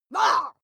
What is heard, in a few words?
witch scream groan short